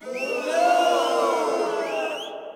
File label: fail; soccermove